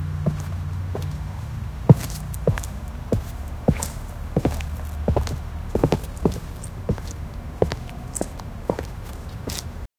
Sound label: soft dog footsteps on pav, dog